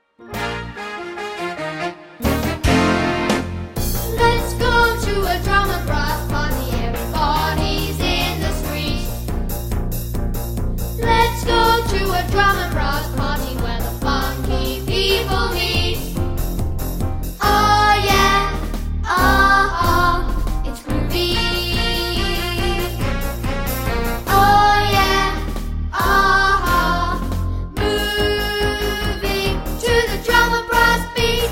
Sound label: Drum n Brass Party